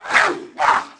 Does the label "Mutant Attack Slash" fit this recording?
Yes